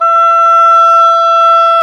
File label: oboe; Roland LCDP04 Orchestral Winds; Oboe Brth 1